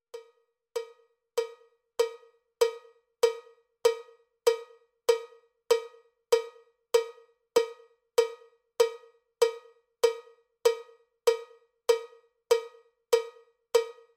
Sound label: cowbell click track bpm 79; cowbell click track bpm 97